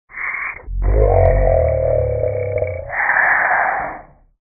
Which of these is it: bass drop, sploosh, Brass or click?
sploosh